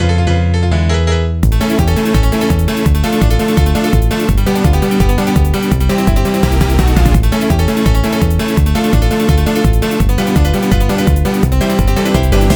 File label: Athletic Theme Percussion Mix; SMW